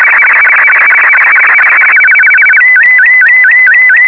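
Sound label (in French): SSTV Martin 2, Slow Scan TeleVision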